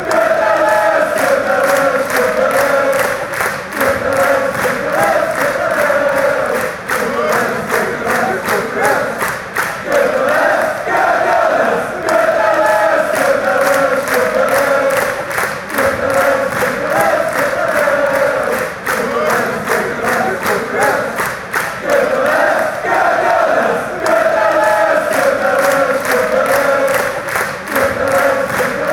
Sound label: jingle bells genk, chants